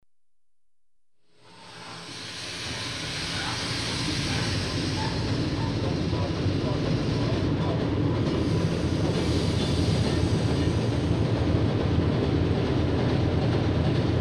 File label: German U-Boat, Diesel engines start, cruise underwater; The BBC Sound Effects Library